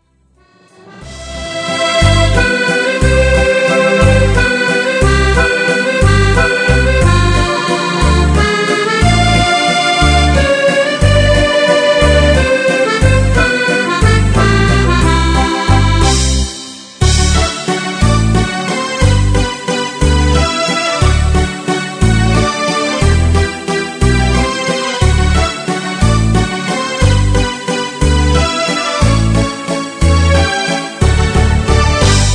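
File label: Viennese Waltz; Valzer Viennese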